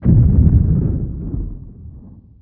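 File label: explosion muffled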